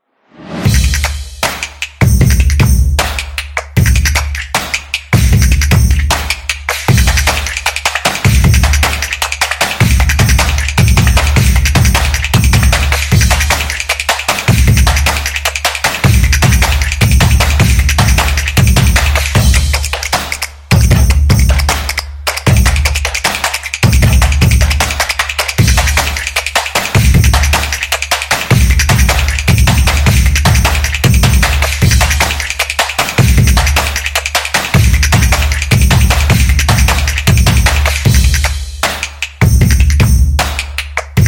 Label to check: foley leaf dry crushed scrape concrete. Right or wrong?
Wrong